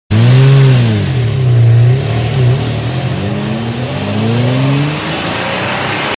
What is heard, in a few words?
Car